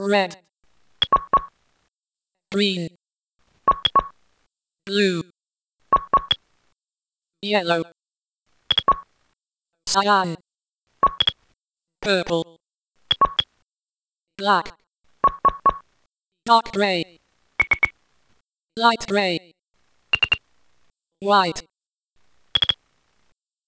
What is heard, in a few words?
Brushtone